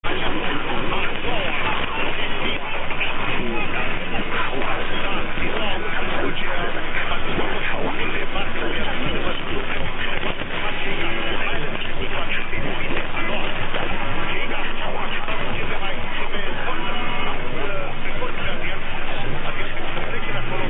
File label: Romania